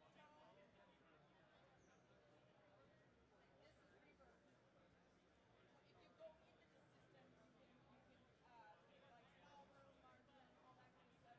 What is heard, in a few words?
Stereo Mix; MyMix Recordings NAMM 2018